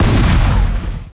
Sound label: CANNON